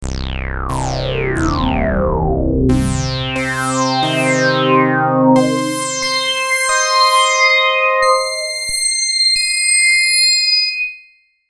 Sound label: filter